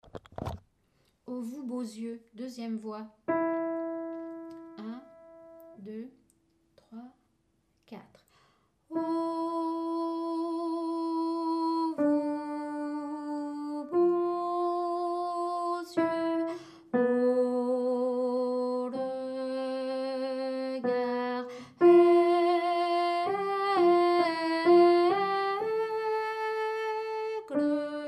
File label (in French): 2ieme voix; Oh Vous beaux yeux